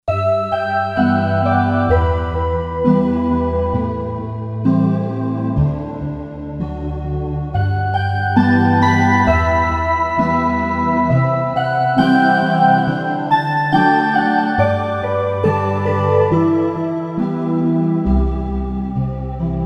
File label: Heaven Key, Roland SC-880; Factory Patches